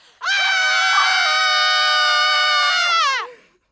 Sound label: Shout